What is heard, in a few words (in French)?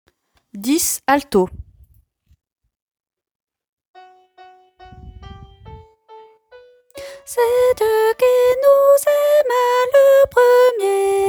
ténor